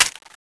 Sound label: awp bolt up, weapons